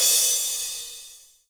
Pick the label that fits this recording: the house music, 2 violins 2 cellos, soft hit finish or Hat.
soft hit finish